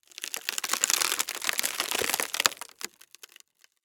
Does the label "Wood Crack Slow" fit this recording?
Yes